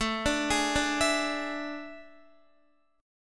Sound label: A Major 7th Suspended 4th, Guitar; AM7sus4; arpeggiated; JGuitar